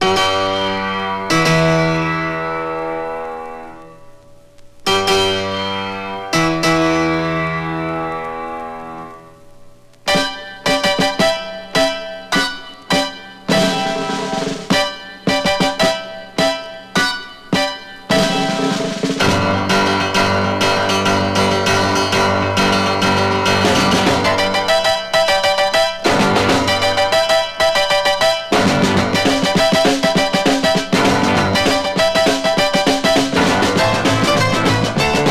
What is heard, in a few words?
rock instrumentals